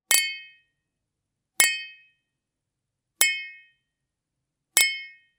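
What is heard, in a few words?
glasses sound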